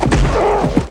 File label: Football Hit Tackle